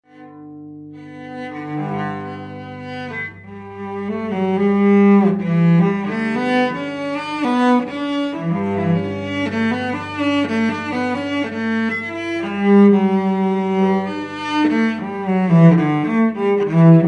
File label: cello